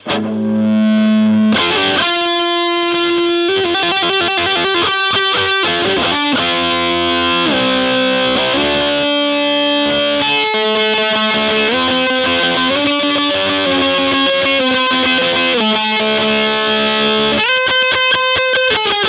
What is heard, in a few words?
Active Mode Bridge; ESP Ben Weinman